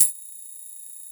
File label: Brass Tambourine